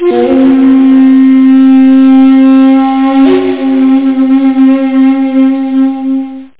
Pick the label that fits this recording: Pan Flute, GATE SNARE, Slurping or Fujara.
Pan Flute